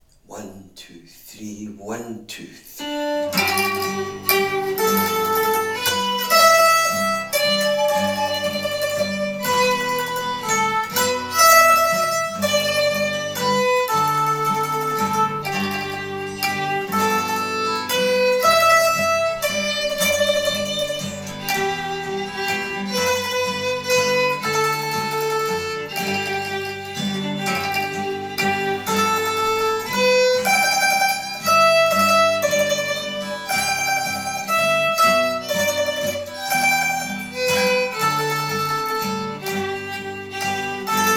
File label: Waltzes; GFW Slow Session; Sine Bhan G